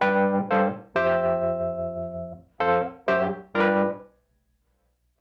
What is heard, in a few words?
Call And Response Wurly Ending, 70s Analog Funk; Sonic Foundry (Sony Creative Software); What it Is!